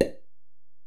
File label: EPerc, Alesis DM-PRO; 300 Drum Machines